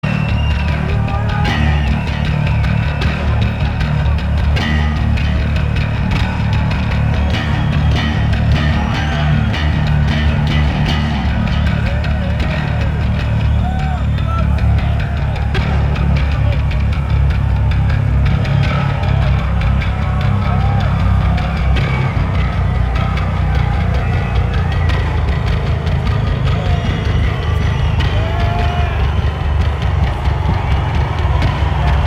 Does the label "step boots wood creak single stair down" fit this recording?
No